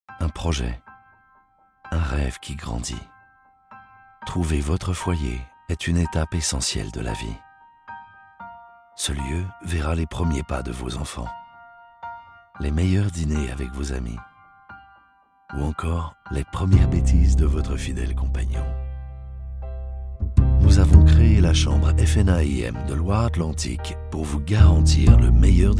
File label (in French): MUSIQUE VIDEO; direction artistique sonore; VIDÉASTES; FNAIM